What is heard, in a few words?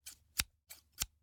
Scissors, snap, snip, shear, med large, double; Eiravaein Works; Vaeyan IV